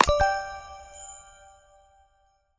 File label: Click Left